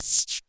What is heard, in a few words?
NOIZ, Dual Percussion Synthesiser; Klone